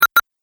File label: Double Click Sound